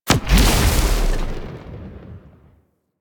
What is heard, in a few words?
Radioactive Asteroid Mine Explode; Ambience; Warframe; Railjack